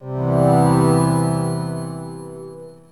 Start Game

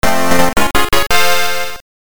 Bonus Fanfare; Jack Bros; Virtual Boy

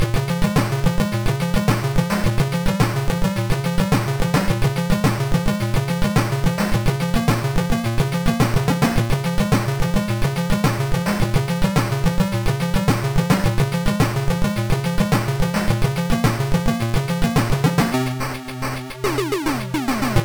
Boss Theme; predator 2; master system; Level 5 - Slaughterhouse

